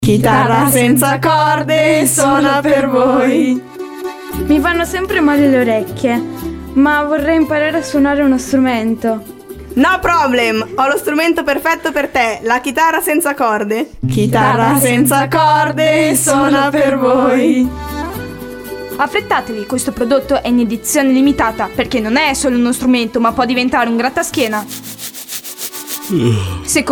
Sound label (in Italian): CHITARRA SENZA CORDE SPOT